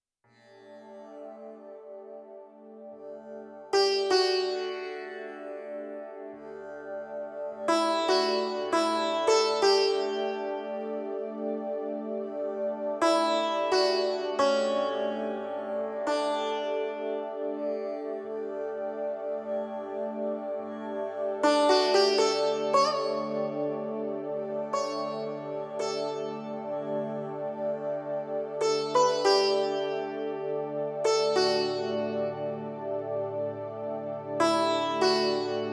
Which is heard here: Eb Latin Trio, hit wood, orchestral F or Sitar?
Sitar